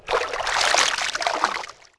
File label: Water lift